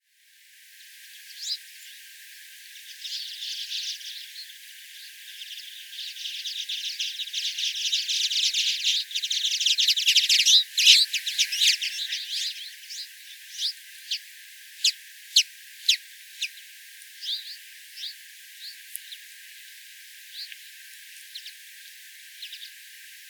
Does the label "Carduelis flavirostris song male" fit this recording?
Yes